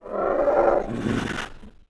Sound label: hurt